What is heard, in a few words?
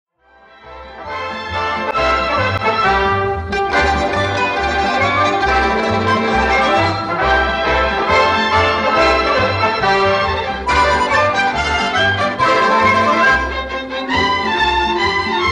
Oldies